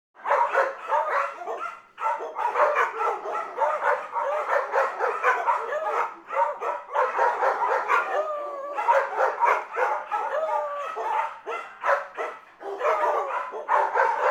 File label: Animals Birds; Sound Ideas 6003